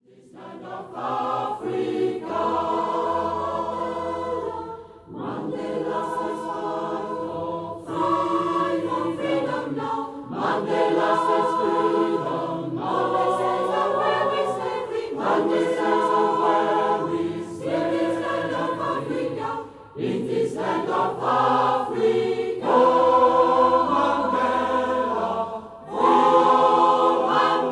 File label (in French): chorale; mandela